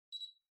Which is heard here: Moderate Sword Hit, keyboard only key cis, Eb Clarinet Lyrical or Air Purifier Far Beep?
Air Purifier Far Beep